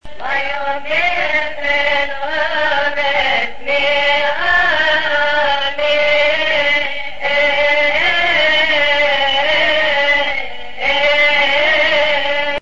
Chorus; kodas; khoras; Fai On Be Khen O Methmi; Maahad Faraony

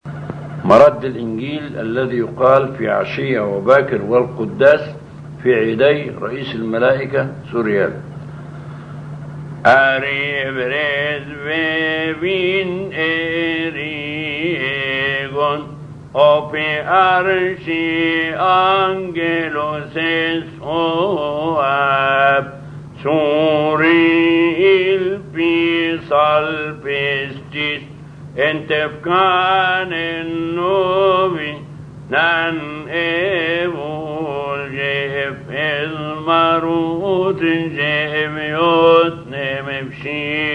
Gospel Response; Alhan Maleyka; Angel Souriel

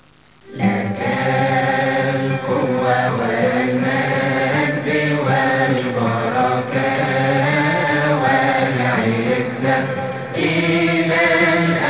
thok te tegom (arabic); holy week

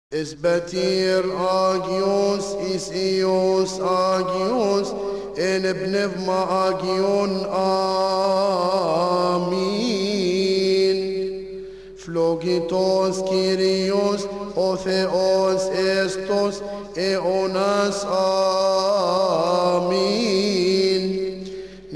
amin espatir (small) coptic; kodas; raf3 elhamal; deacon; espateer

